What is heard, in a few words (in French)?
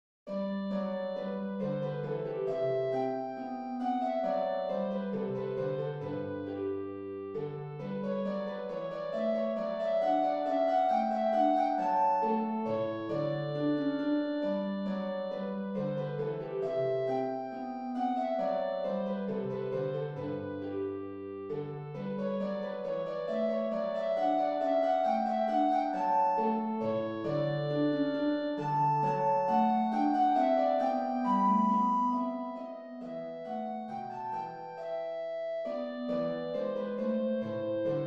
boura sol majeur; haendel georg friedrich